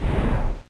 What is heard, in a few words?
strength push